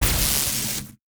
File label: sfx research station lightening; Stellaris; nemesis